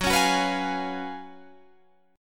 Gb Major 7th Suspended 2nd Suspended 4th, down, Guitar, Drop D